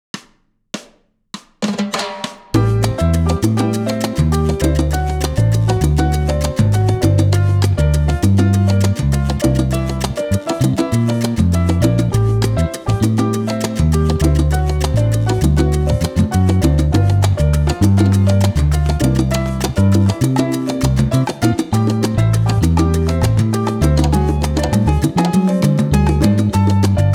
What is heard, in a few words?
4 Chord Loop Montuno Am7-D7-Gm7-C7